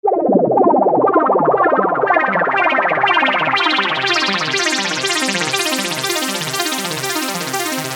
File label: Arpeggio 120 C, Techno Synth Loops; 120bpm; Sonic Foundry (Sony Creative Software); Signals I